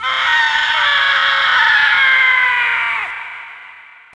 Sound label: death; alienarena; femforcer